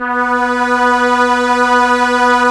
Strings Arp SE B4, ARP Ensemble; Keyboards of The 60's and 70's

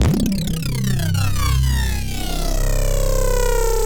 bass shot C

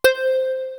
WAVE HRP, KORG; WS KORG; East West; Global Sounds

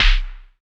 SAND, Drums; Distored Reality 1&2

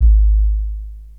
Sub Bass, bass drum; Drum Hits

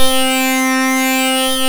LFO SYNC A1, ARP Synths; E-MU Formula 4000 Series Vol. 3; Analog Odyssey

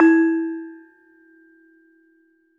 LAMELS E3, Asian Traditions; USB Soundscan vol.60; AKAI; LAMELOFO

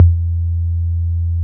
tom, Yamaha TG-500

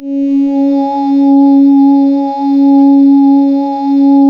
C#, Synclav FM; Big Fish Audio; SPACE1

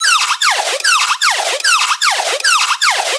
LAZER BEAM

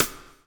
BLUES TK, Backbeat; Spectrasonics - Backbeat

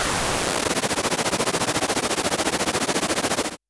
Air FX, Filtered Noise; noise hiss crackle

